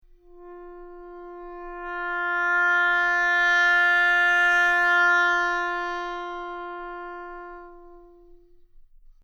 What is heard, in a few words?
oboe